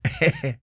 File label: LAUGH, blues soul; Spectrasonics Vocal Planet